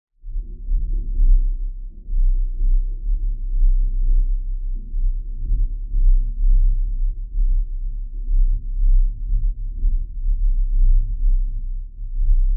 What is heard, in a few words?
sound design lfe rumbling rolling sub bass